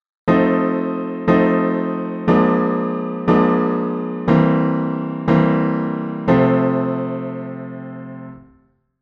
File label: authentic I IV V, 16 edo; 16 edo theory